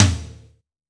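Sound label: ambient tom, GM drum set; AKAI S-Series CD-ROM Sound Library VOL-8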